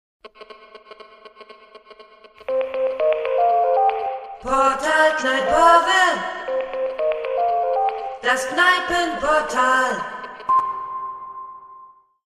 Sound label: jingle